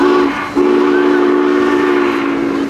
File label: Santa Fe 3985 Yermo whistle; Trains